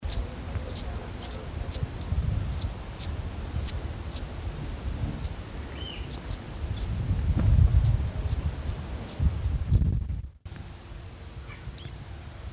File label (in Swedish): skärpiplärka